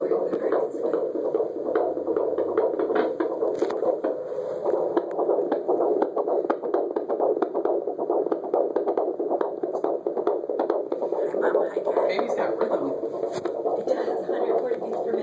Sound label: heartbeat